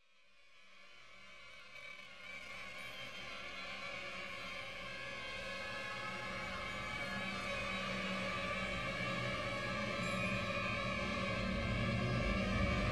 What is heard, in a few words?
peeling leath; rs gholem; riot audio